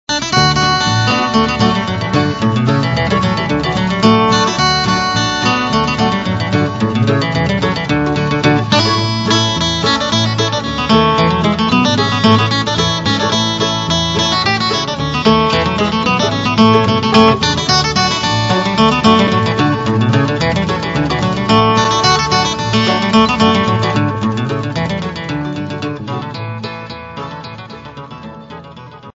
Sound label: Flop Eared Mule, guitar